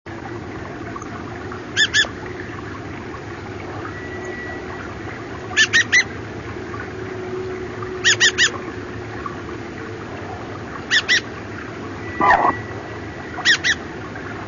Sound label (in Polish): drozd rdzawy - Turdus naumanni; wroblowe; turdidae; ptak występujący w północnej Azji, do Polski regularnie zal